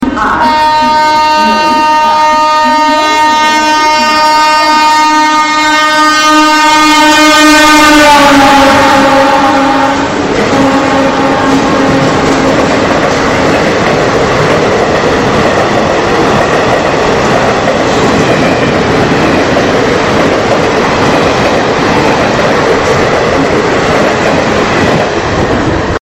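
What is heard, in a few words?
Indian Railways Sound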